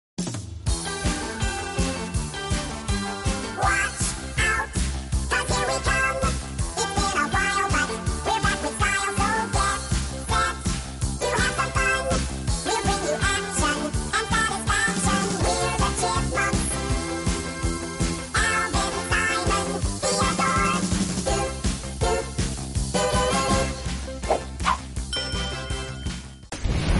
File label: cartoon theme song